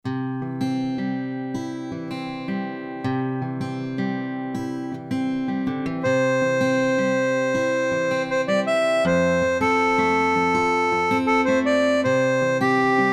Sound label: STRUM GUITAR